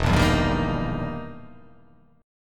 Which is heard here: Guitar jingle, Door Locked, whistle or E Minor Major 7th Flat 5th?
E Minor Major 7th Flat 5th